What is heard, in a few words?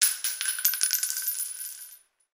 warfare bullet drop on floor; fork media